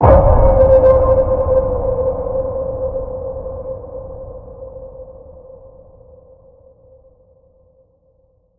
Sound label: Deep Waters Ping C